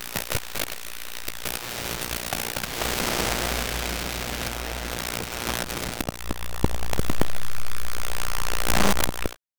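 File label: an intense electric, digital static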